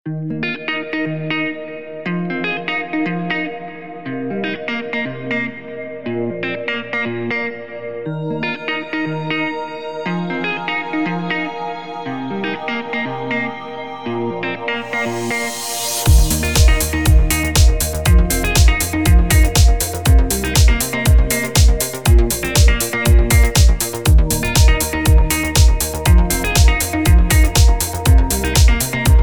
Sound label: Upbeat